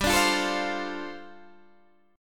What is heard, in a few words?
G 9th Suspended 4th, Guitar Open D